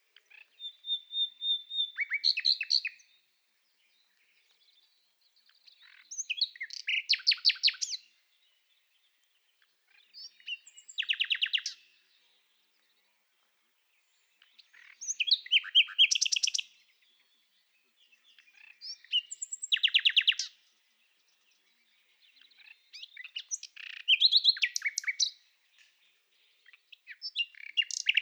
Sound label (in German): Nachtigall, Luscinia megarhynchos; Oliver Swift